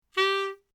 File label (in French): Clarinette G3; Acoustique